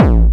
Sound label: TEKKNO, BASSDRUM; Drum Hits